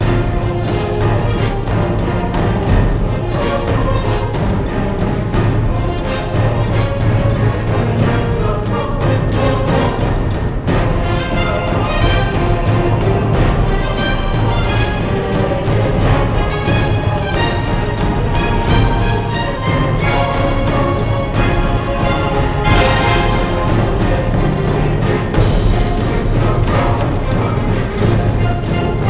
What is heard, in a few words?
Motivational; Crysis